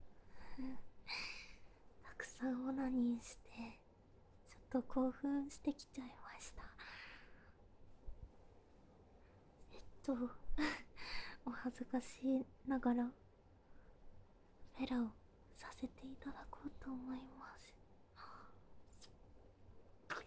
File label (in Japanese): フェラ カウント ステレオ